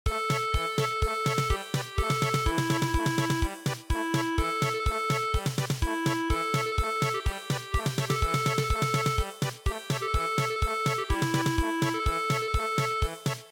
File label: German Polka